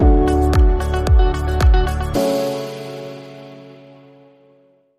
Bumper

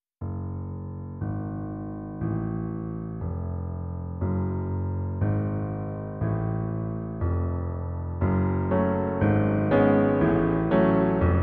Turkish March, Piano

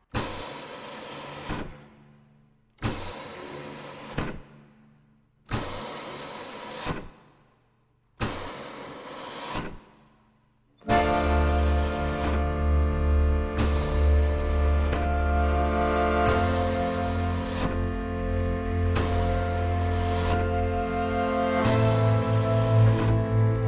Steam Engine Dilemma

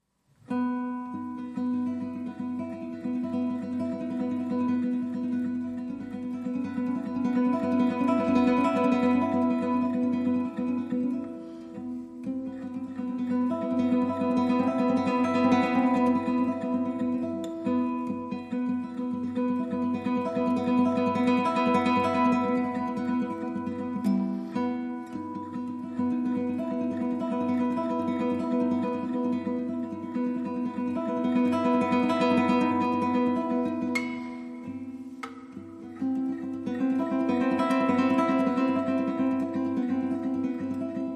Guitar

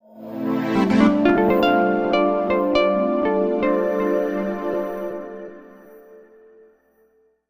Sonic Funk No Drums

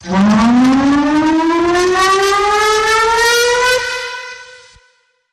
Rise Short Signal

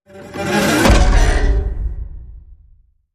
Broken String Hit

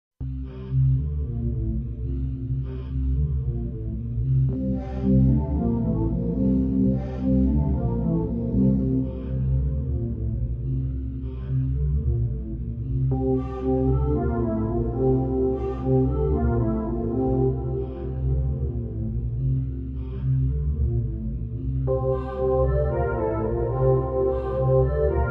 Rhythm

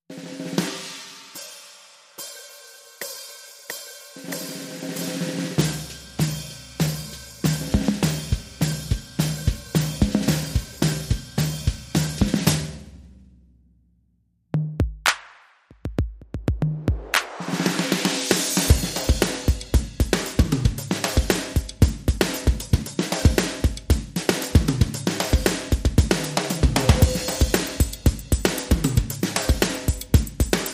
Drums Only

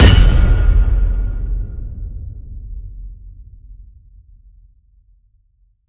Throw a Ringer Hit

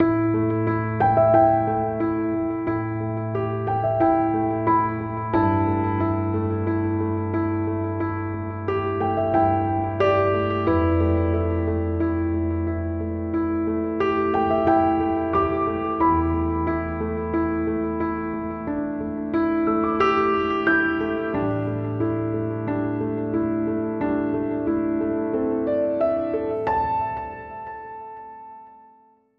Pause For Thought, Piano Only

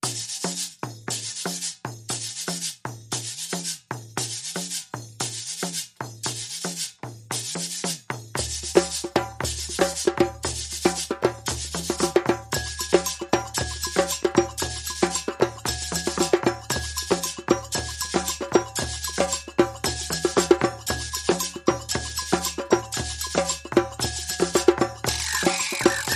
Soca, Small Perc Only

